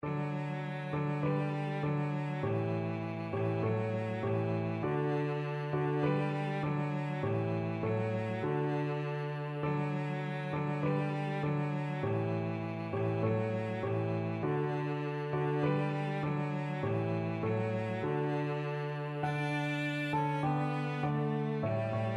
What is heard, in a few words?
cello